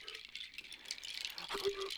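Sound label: Music Village Calm Near; From Dust; ps3; xbox 360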